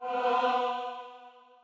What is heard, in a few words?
choir